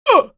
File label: pain, female; episodic; episode 1; Source engine